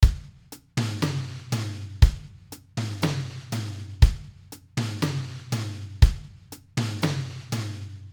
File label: rhythm habanera